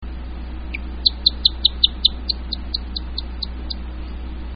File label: stenodactylus